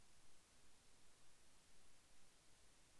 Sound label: Output GND MUTE Non Chage